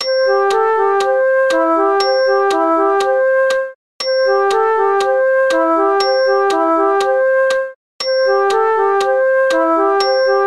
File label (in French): Création carillon